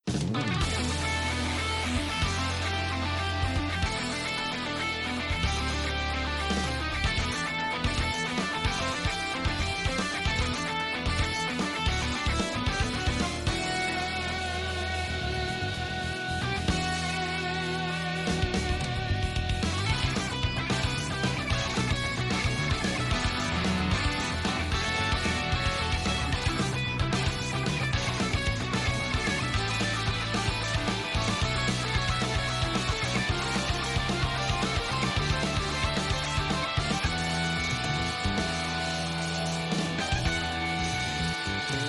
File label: rock version; psmd